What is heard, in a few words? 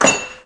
equip item